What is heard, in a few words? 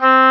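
C4 soft straight, oboe; Northstar - New Gold VOL-2; EBONY OBOE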